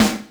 snaredrum, Yamaha RM 50

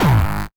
Hard Kick

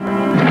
Reverse Horn (one shot G); Sound FX

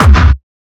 UK kick

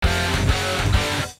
Hard rock close; Nokia Ringtones; Audio themes

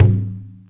Conga M, Linn LinnDrum Percussion; Drum Samples

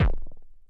kicks, Bass Drum

